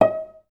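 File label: cellos pizzicato D#2; Best Service; Peter Siedlaczek's Advanced Orchestra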